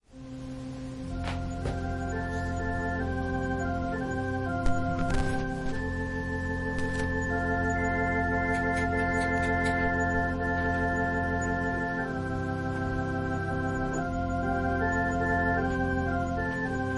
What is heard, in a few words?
Organ prelude